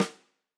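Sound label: Tam Snare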